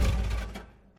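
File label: creaky quiet sound of metal plates; skripuchij negromkij zvuk metallicheskih plastin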